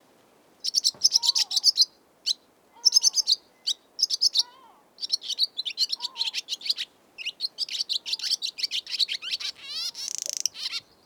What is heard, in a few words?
Barn Swallow, Hirundo rustica; swallows swifts